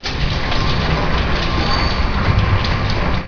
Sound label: lift